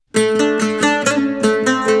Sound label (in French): luth